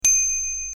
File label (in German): Fahrradklingel; Aladin